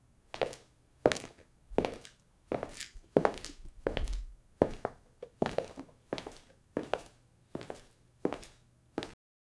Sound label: isolated sound effect of, concrete